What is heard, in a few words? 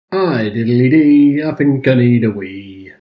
drinking tune